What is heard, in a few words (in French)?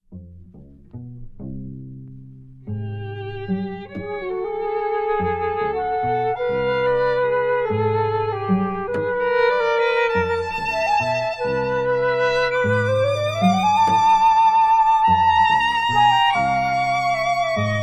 Trio pour flute violon violoncelle; op 31 no 1; mvt 1